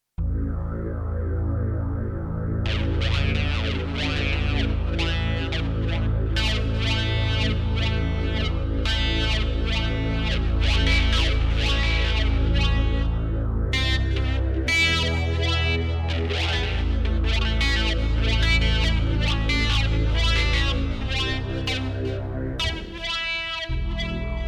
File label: keys